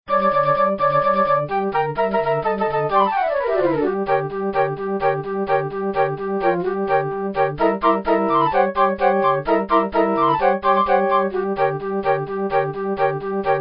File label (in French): orgue; southpark